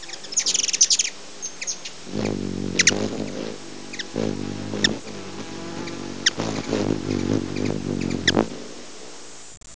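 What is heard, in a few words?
hummingbird, birds on the Costa Maya; Colibrí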